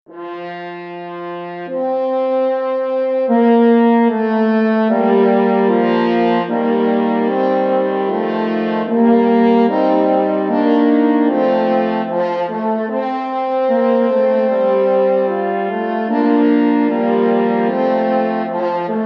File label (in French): Trio de cors